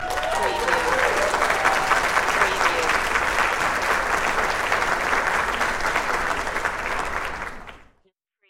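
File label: Large Crowd Applause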